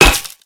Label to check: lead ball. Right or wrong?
Right